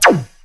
zapped; Zaps and Beeps

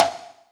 womb, cowbell blocks, misc percussion

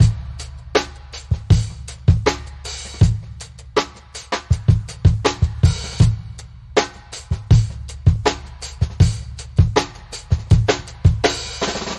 grunge funk, Drum loops Boombap

